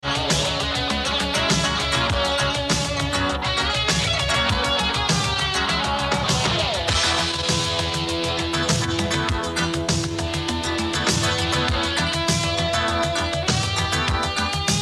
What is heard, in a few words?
music; secrets of wrestling 87